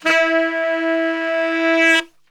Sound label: E 2 SAX, Brass Super Section; Best Service